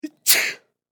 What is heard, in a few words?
Human Male Sneeze; Sneezing